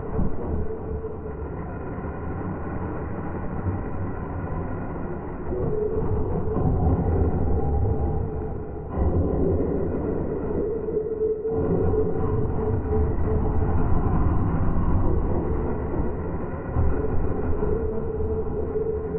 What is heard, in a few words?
ambience; fear